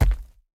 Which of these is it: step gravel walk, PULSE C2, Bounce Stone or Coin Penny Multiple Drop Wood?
Bounce Stone